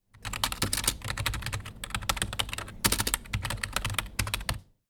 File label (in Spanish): Paisaje Sonoro Teclas ordenador